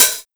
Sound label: Hi Hat Closed TR909